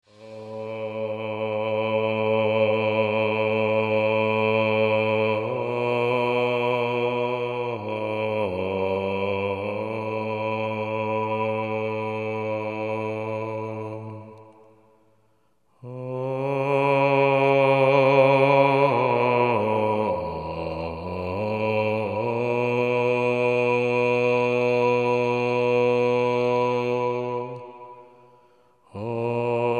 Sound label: Chant